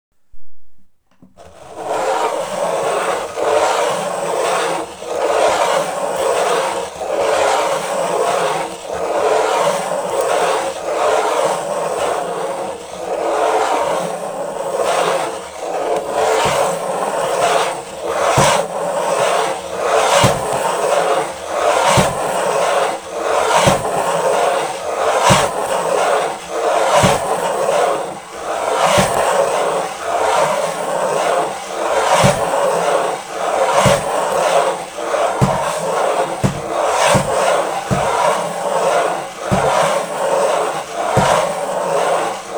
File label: silence horror